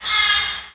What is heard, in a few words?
saw, circular saw hit; weapons